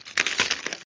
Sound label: Drink loop